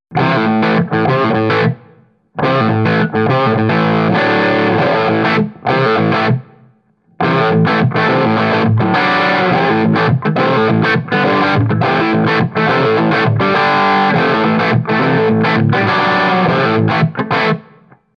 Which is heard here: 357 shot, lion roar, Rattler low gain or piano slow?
Rattler low gain